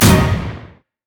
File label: teleport moonlight; Diana